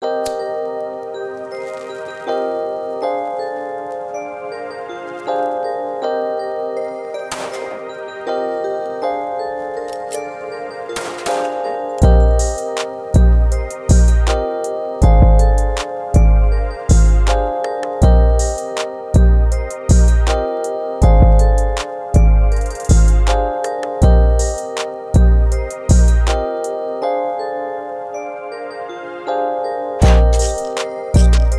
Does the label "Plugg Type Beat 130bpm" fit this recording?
Yes